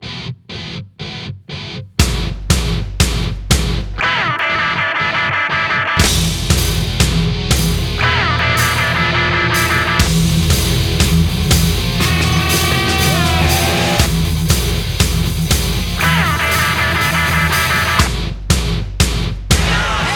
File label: Gray Guitar